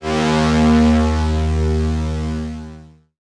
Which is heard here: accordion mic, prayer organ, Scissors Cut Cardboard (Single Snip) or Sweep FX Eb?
Sweep FX Eb